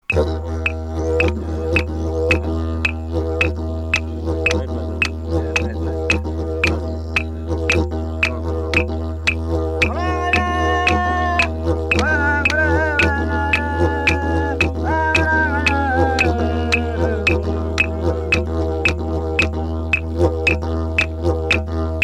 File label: aboriginal didgeridoo